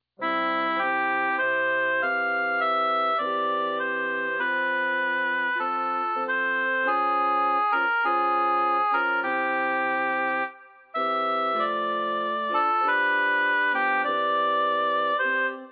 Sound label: soprano